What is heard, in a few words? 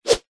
sport tennis racket swing swoosh whoosh air; zapsplat